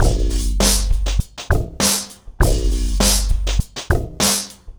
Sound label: Remixed Drums 100BPM; The Roots Drumloops